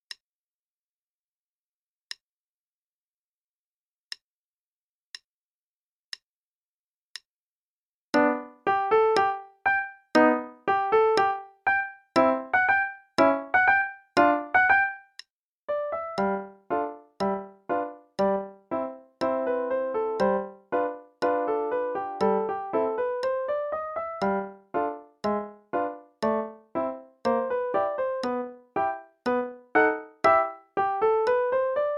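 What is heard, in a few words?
Play-a-long, quarter note=60; Beach; Pantalon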